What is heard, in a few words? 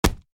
heavy taunt chest hit; vo; taunts; tf2